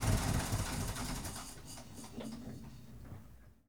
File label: vehicle yacht turn off; teardown ps5 windows xbox series xs gamerip 2022